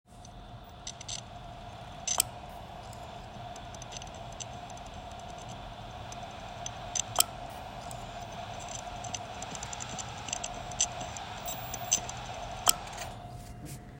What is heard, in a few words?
Hard drive dead